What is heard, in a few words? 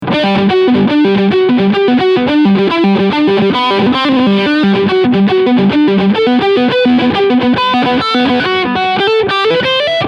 Lead Steg HB